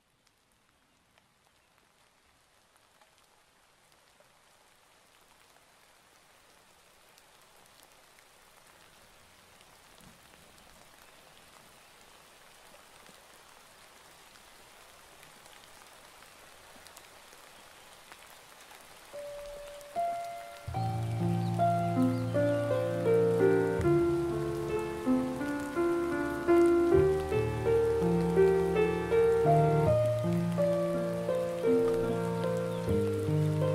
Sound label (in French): Piano pluie